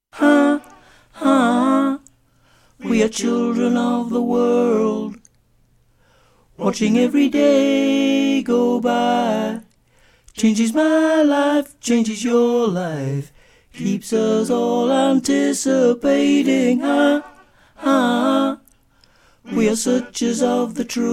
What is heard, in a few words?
Children - Mezzo, Choir Harmonies